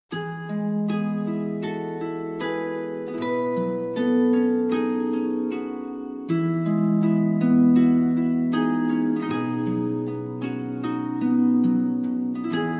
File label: slow rising hope ode to joy reimagined for harp